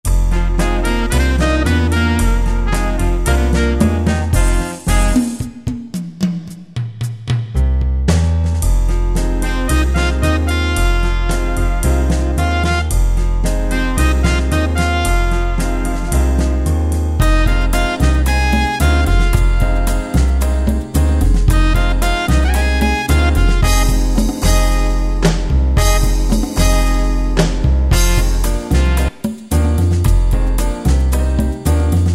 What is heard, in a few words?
Cool Jazz, Yamaha QY70 Preset Styles